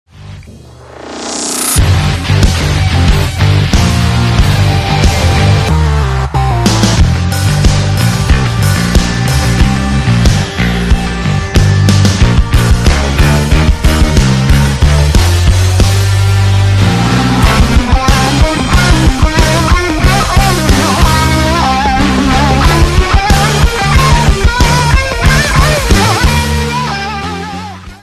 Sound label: classic ringtone